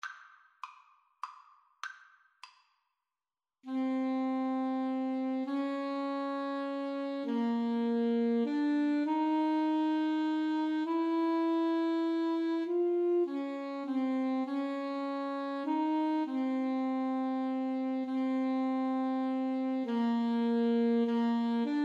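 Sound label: Alto Saxophone Duet, karaoke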